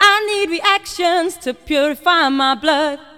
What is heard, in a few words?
VOX SUNG; Megabass Remix! 2